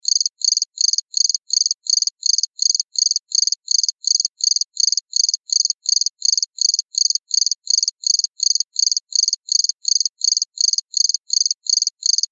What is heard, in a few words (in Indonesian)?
jangkrik; masteran